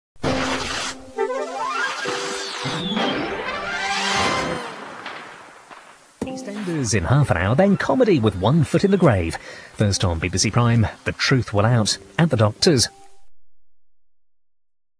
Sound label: jingle; bbc prime